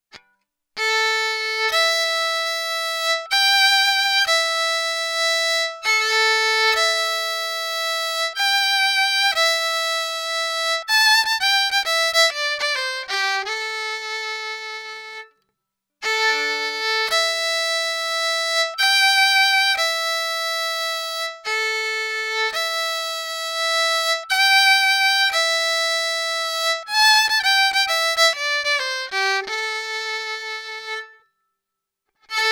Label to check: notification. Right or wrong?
Wrong